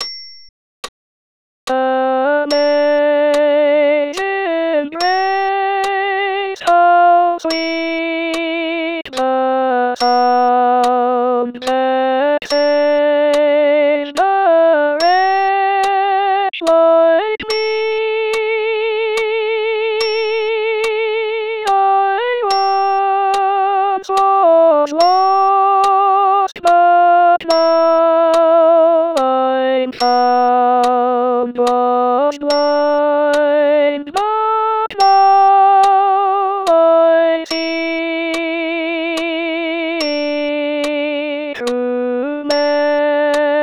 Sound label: Amazing Grace alto